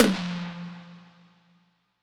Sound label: Tom Smack Mid Verb